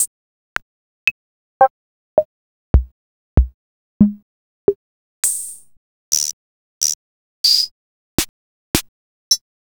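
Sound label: Roland MV8800 drum CR-78; CR-7800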